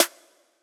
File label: snare808